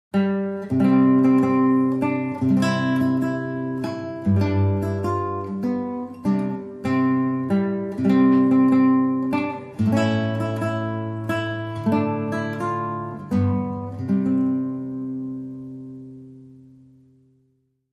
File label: Solo Guitar